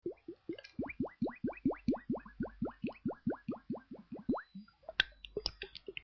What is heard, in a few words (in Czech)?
bublání